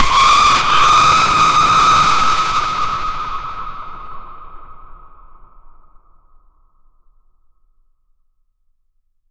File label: a loud sudden horror jump; jumpscare